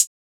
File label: Closed Hats